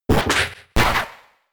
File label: Thrash; attack moves; Pokemon; Gen 4; DPPL HG SS